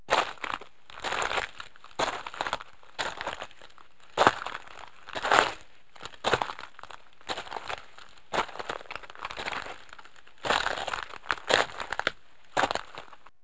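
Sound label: footsteps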